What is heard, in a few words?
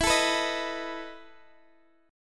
E Major 7th Suspended 4th E, chord